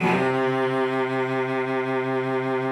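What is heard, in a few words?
cello vibrato, C3; Xsample VOL-7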